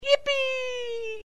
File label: kid yippee